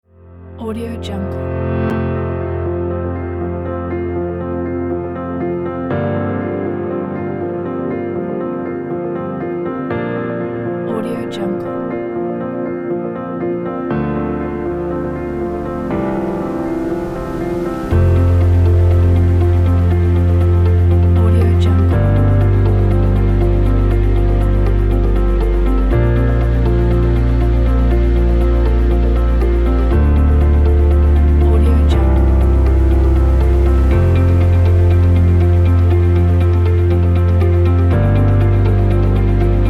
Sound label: ambient corporate background